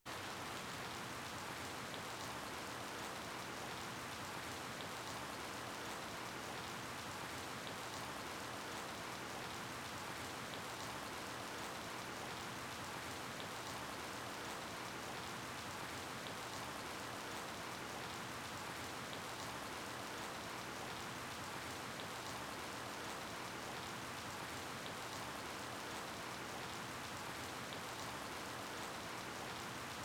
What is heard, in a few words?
Ambience Rain; harvest moon light of hope